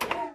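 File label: enemy turret open; AssaultCube; Alien Stars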